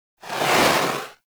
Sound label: Heavy Grenade Launcher Projectile By; Warframe; Archwing